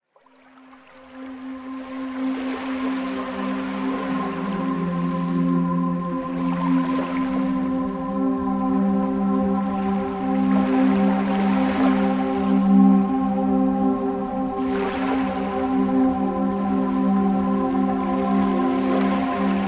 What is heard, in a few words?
Relaxing Piano